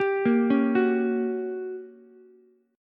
G Minor Major 7th Flat 5th, G, down, Ukulele; arpeggiated; JGuitar

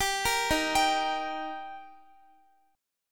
D sharp Major, Guitar; D# arpeggiated; x,x,17,15,15,14

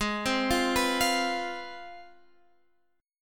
C Major 7th Suspended 4th Sharp 5th Ab, chord

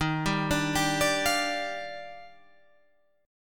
D sharp Major 9th, Guitar Open G

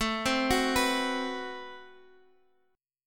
A Minor 6th Add 9th, Guitar Drop D

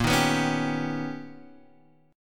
B Augmented Major 9th Bb, chord

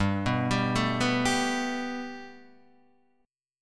G Major Add 9th Guitar DADGAD, chord

